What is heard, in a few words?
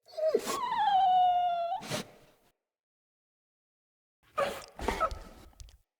Wolf Cub UI Menu Idle; Vocals; Animals